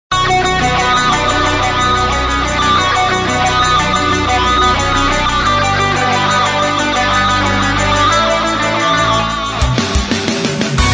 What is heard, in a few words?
Symphonic Metal